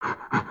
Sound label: idle, creatures organic